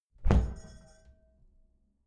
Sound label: glass door close heavy glass clang